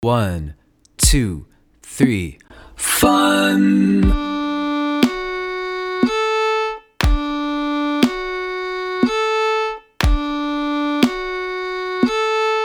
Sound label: D triad D0 2 A0 slur across strings, fiddle; make your own scales